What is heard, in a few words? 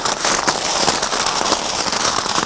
VELO GRAV, MOTOS; East West - Global Sounds